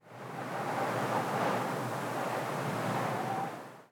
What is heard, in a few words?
wind gust